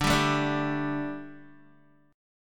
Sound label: D Suspended 4th D down Guitar; strummed